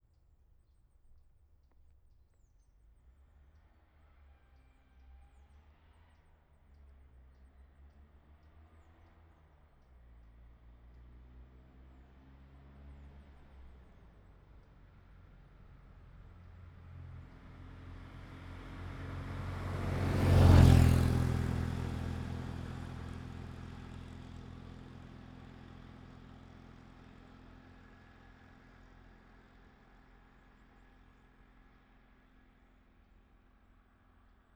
Fiat 500 Pass by Slow Stereo Mic; anno 1971; On The Fly Audio

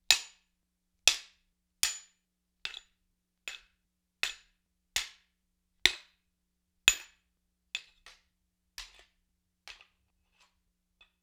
Axe Katana Blade on Blade; StillNorthMedia

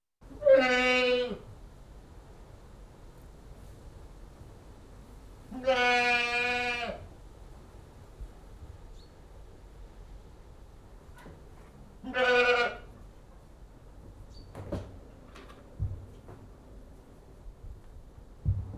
One Old English Goat Bleating; Livestock; BBC Sound Effects Library

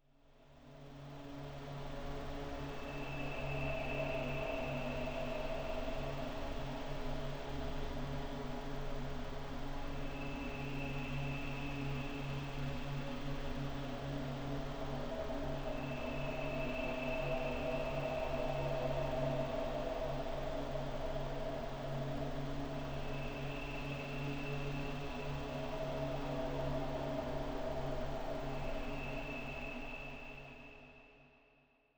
Magnetic Field; 99Sounds; Magnetic Fields

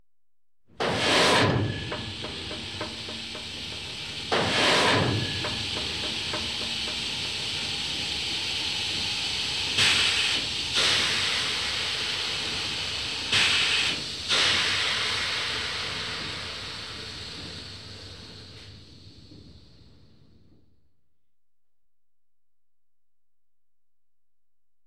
German U-Boat, 2 Torpedos Fired; Ships And Boats; BBC Sound Effects Library; Ships And Boats 1

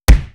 Hit; NoizBoy; Hit Kit 1

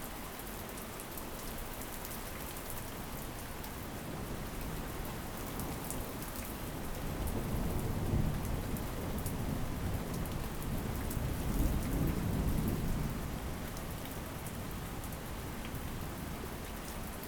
distant thunder; 99Sounds; Rain And Thunder

